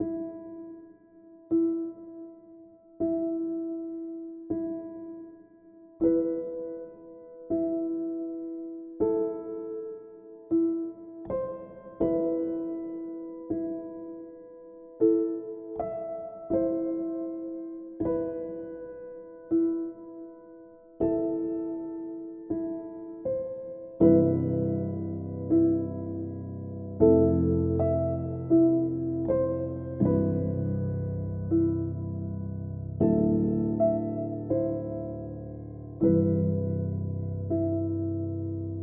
cinematic drama pensive Piano Em 80bpm

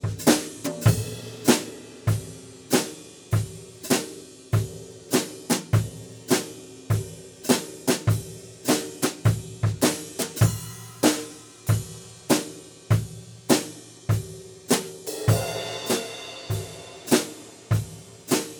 Overheads

